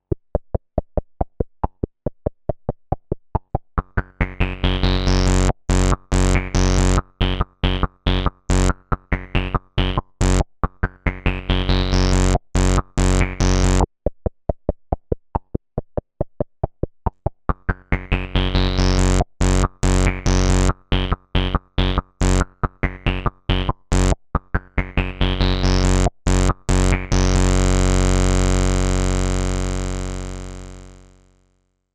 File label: Megacity CV Crossmodulation; Analogue Solutions